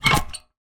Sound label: household garbage can metal lid open